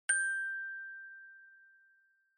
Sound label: Music Box Single